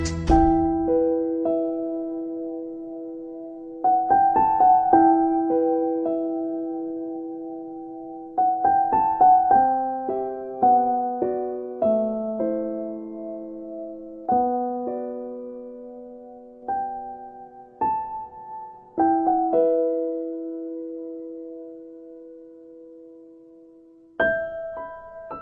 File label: Mobile ringtone